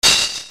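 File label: percussion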